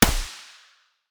p90 silencer mono distant, weapons

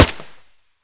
ak74u silencer stereo; STALKER; IWP

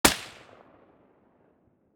silencer sv98 mono far

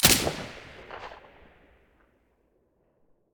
silencer stereo, weapons

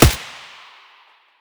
svu muzzle mono distant, weapons

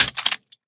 pistol drop glass; STALKER; IWP

